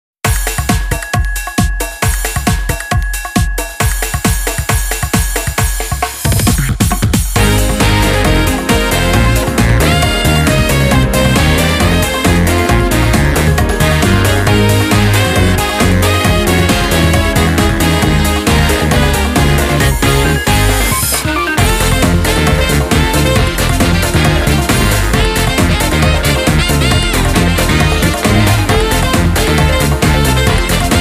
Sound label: Music Success event